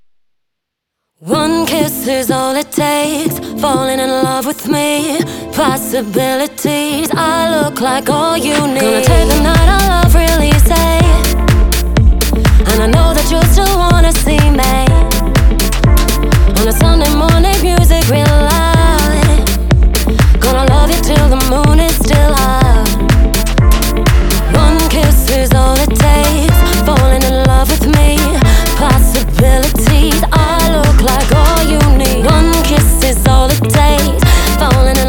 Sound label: Dance Medley